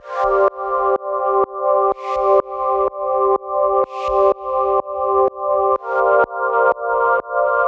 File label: Pad